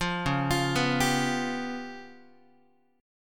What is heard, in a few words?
Db 7th Flat 5th Guitar, Db7b5 chord; Db7b5 arpeggiated; JGuitar